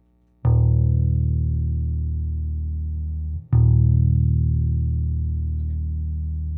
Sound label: bass